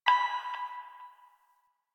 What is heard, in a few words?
Hack Minigame Touch Node